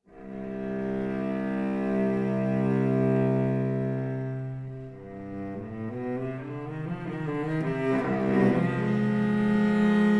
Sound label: Cello Suite in C Minor, Prélude; Cello Suite No. 5; BWV 1011 I.